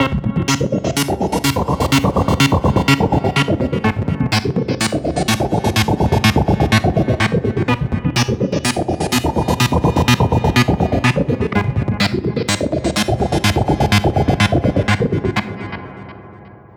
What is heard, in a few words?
Fast Signal 125bpm